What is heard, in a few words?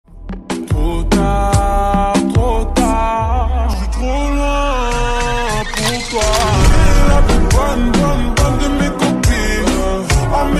slowed reverb